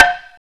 MID BLOCK, METAL & WOOD; Roland - LA Composer vol. 1

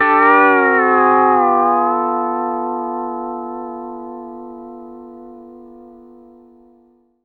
G9, PEDSTL CHORD

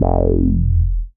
bass F1, EMS VCS3

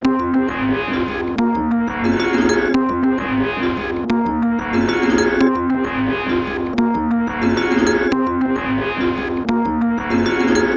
Melody, Hip-Hop

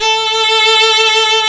STR SECT A3; AKAI S-Series CD-ROM Sound Library VOL-2

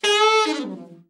ALT FALL, ALT-SAX FALL; Giga Samples Collection

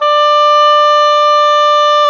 OBOE, Orchestral Winds, Oboe mf f

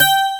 12STR, AC.GTRS

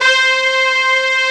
BRASS SECT C4; AKAI S-Series CD-ROM Sound Library VOL-2; TP+SAX2

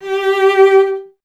STR VC G 0, Cello; Miroslav Vitous; Solo Instruments VOL-1

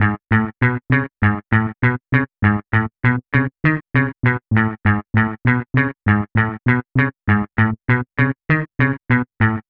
Bass, Hip-Hop

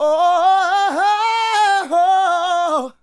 Adlib, Hip-Hop and RnB Vocals; Sonic Foundry (Sony Creative Software)

